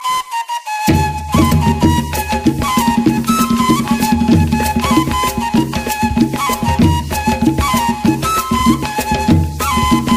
Cumbia

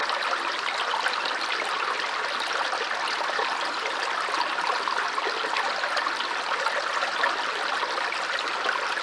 Stream

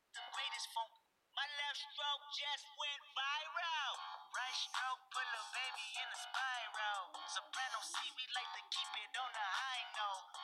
Rumble

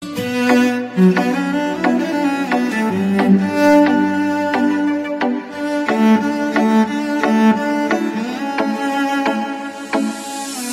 cello

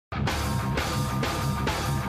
snare drum

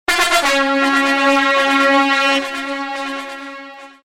Dancehall Horn

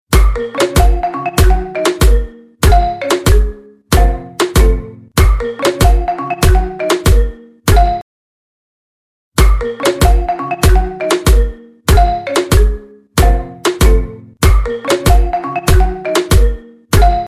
iPhone Party Remix

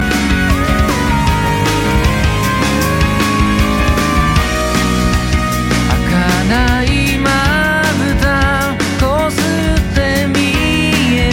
Waltz

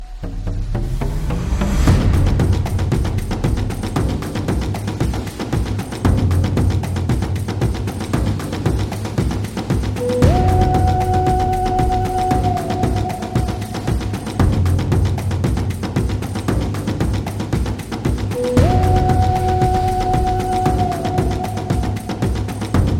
Native Drum N Flute

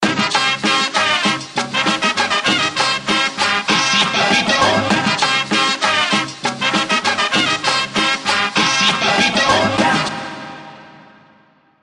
Cumbia Sms Mexican

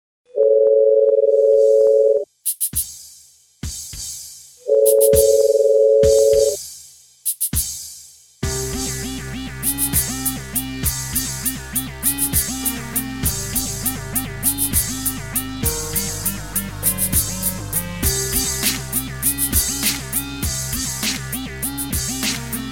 Electra Beat

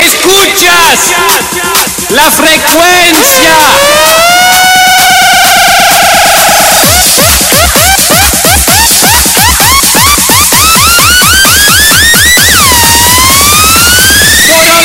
Rave

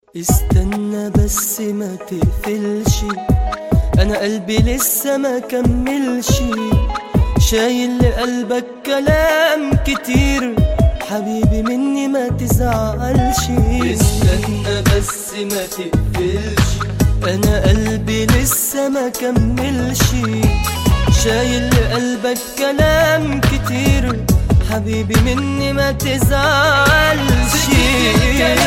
Arabic Song

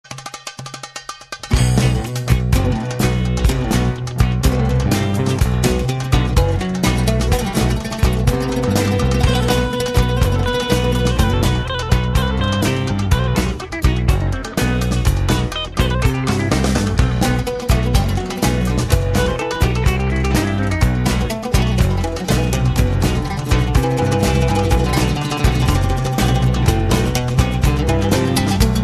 Drums

